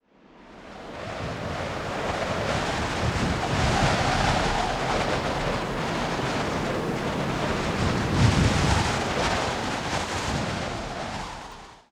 whirlwind target

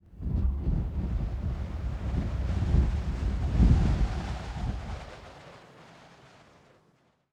whirlwind

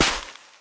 step sand

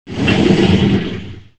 bellows out

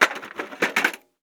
DOOR TRY

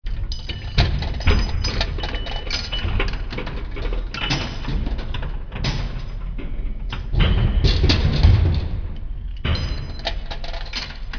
mine ambience

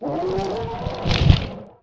attack; mahlendur

